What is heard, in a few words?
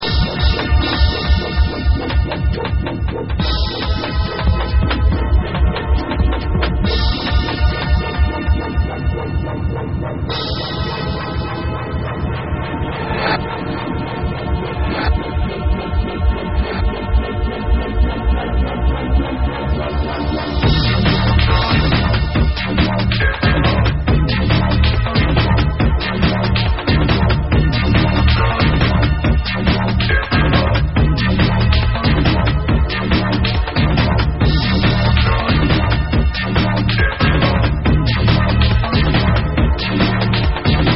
break